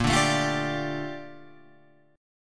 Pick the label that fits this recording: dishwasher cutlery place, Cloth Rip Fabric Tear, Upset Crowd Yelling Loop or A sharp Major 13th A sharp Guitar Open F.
A sharp Major 13th A sharp Guitar Open F